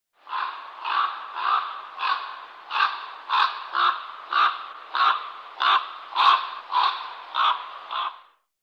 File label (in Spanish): cuervo